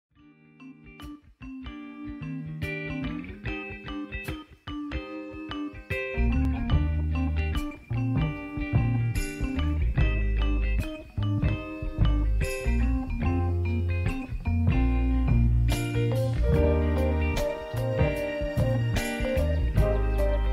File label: Sunrise Guitar alarm sound; Mudita Bell